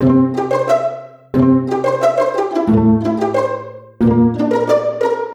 Pizzi, strings

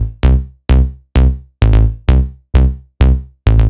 Bass, electric jazz

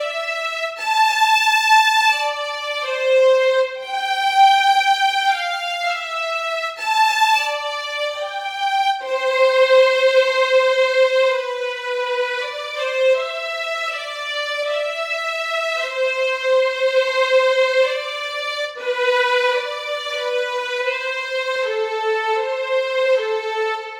violin; Скрипка № 3 — сэмпл для драматической сцены; Кино, Саундтреки

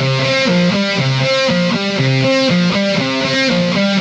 Lead

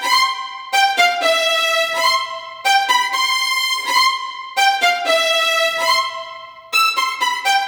String Theory; Disco House

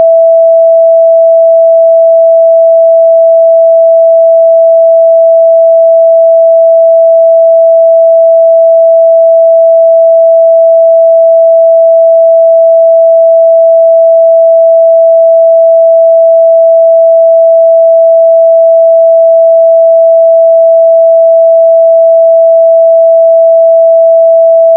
violin

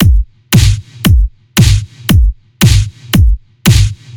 Pitched, electro pop

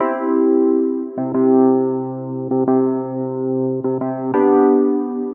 Obscured EP

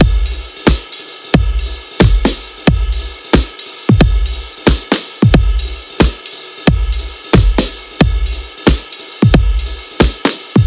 Jazzy; Ударные и барабаны; Электро Джаз; 130 BPM; Drums

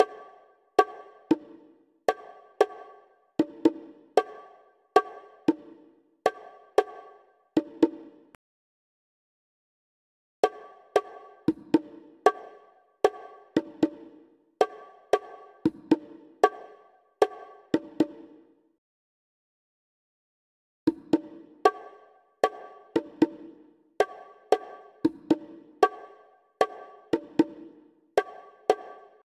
Bossa Bongos 115 BPM; перкуссии percussion loops

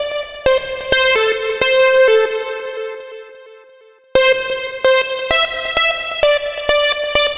80s Flav Lead; Сэмпл синтезатора; Electric Jazz; 130 BPM; loop; Synth; Электро Джаз

